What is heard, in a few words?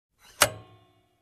Motorcycle stand